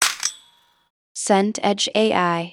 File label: goal net ripple